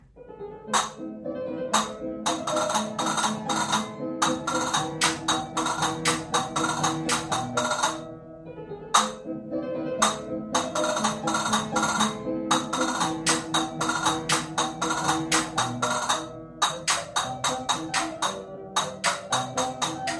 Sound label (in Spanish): Marcha Turca fragmento